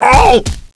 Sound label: Garlic Die; Eft